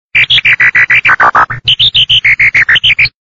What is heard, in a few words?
robot chirp; animals